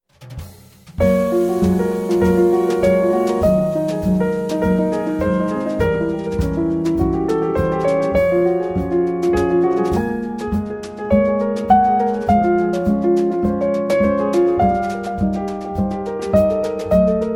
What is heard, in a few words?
Sungshin Piano